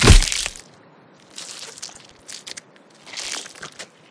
Neck snap; Five Nights at Ronald's

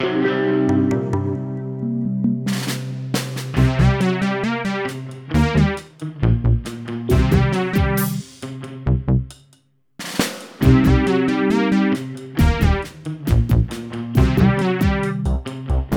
Character Menu Zombie

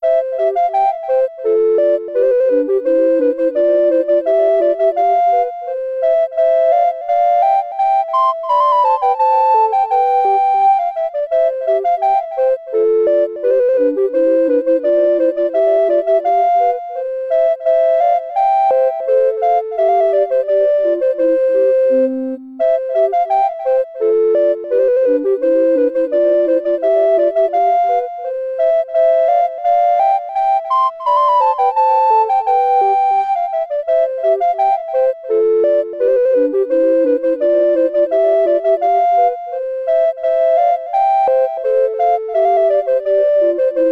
Movie BGM - Recorder

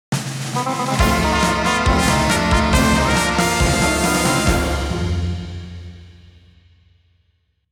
Power Activation; Advance Wars 1+2 Re-Boot Camp

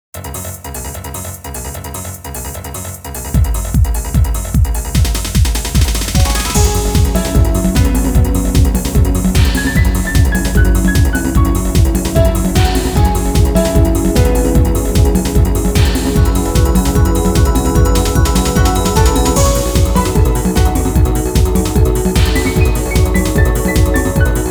Lost Techno (Battle); culdcept ii original soundtrack deluxe 2007

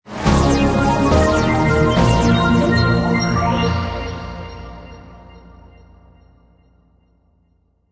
Level Complete; super turbo demon busters

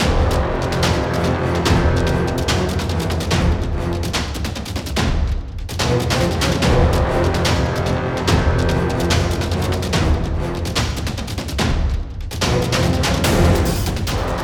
MiniBoss Loop; skykeepers; ps4 windows xbox one gamerip 2017

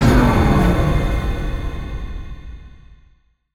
Stinger Player Death Stereo; Infinitum

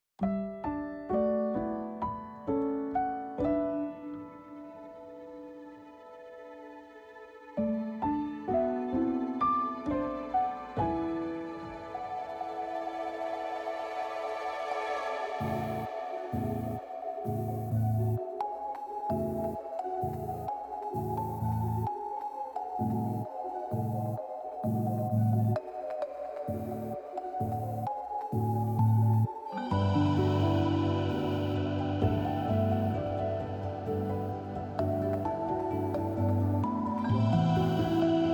Forest Music Harm And Melody

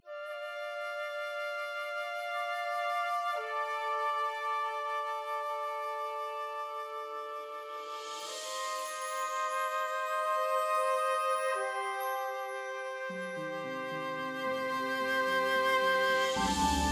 Pad; Maharaha Prince

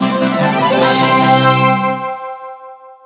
Ui Fanfare; super sanctum td

